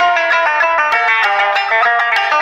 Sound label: guitar; Phonky